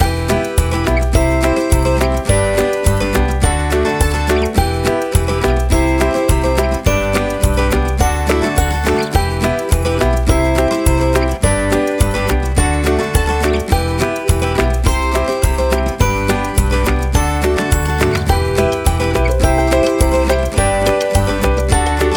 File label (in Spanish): audio loop alegre